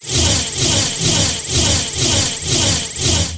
weapon skill

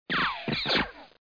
explo

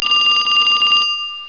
Phone Ring; Bambini

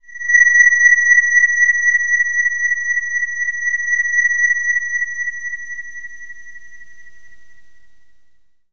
beacon, space; Select Soft Publishing Stock Clips Multimedia Clips

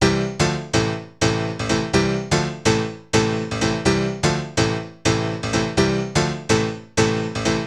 piano house 125 A; eJay; Keys

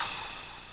spl cym, midi

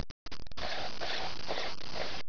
jar close; 12000 Graphics Pack - A Complete Graphics Collection For Your PC

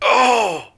guy mouth

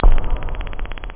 drums bass; da capo vol1

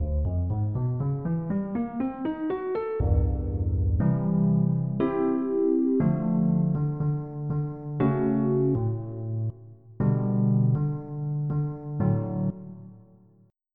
Piano2; FF7

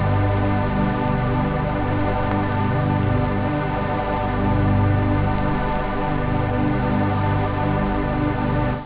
string, synth chords

